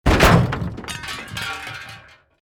CRASH VEHICLE LARGE DEBRIS, vehicle accident sounds; A series of vehicle accident sounds created using FMOD; Crashes